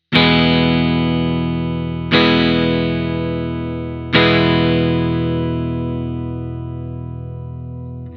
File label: chord e major, guitar